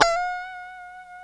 G 5 FUNK SLD, GUITARS, FUNK STRAT; Spectrasonics; Hans Zimmer Guitars VOL-1

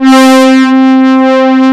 MOOG BRAS, Moog Synths; E-MU Formula 4000 Series Vol. 3 – Analog Odyssey

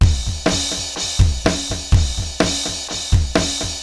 80s delay Akai XE8 (125BPM), cyborg beats

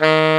D#2, TENOR SAX; AKAI S-Series CD-ROM Sound Library VOL-7

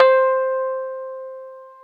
Keyboards Old School, C4; USB Soundscan vol.09; AKAI

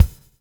bubble; Spectrasonics - Backbeat

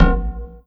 Perc OneShot, Processed Percussion; Sonic Foundry (Sony Creative Software); Rhythmicronics

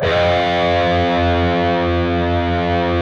PRS F#, Guitars, LEAD

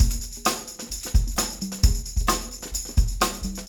130 PSHACK bt + tam

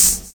SUB HH; Univers Sons - Kitch 70s

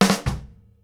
KICK SNARE, Live Snares

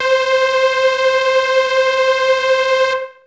303-Style single hits

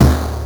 Timpani; Genesis

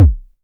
kick, Korg Electribe ER-1; Kit 17

